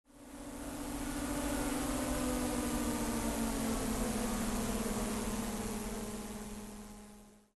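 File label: Airstrike Flyby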